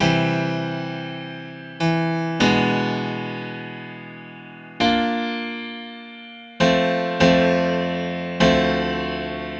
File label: Grand Piano 100 BPM (C Major); All Alone; Ghosthack; Free Guitar And Piano Samples